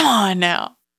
C'mon Now Dry, Vocal Samples, Single Voice Melodic Loops; Singles